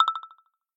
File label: bamboo, message Received Sounds; Session iOS